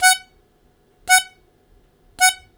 Short Attack F#2, Harmonica; Zero G - Harmonica

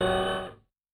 Motor; Yamaha DTXpress-II

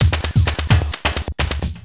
Beat, Industrial

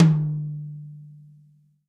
TOM, Real Drum Kit

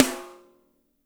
SNR; Spectrasonics; Burning Grooves

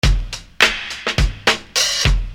Beat, Hip-Hop Street Beats

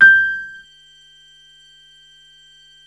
VOX PIAN; MORPHEUS

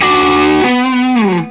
SOLO, Vintage Blues Guitar; AKAI; USB Soundscan vol.22; SOLO B060

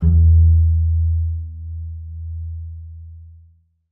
E2, bass pizz; Xsample VOL-5

